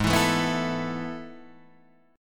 A Major 7th Suspended 2nd

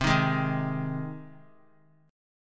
C Minor Sharp 5th C 3C,3F,3A,3D; strummed; JGuitar

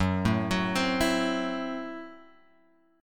F sharp Minor 7th Flat 5th F sharp Guitar Open G

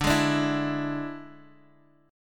D Suspended 2nd Flat 5th, Guitar, Open F; x,9,8,9,7,x; strummed

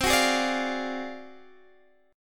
D 7th Sharp 9th Flat 5th C, chord

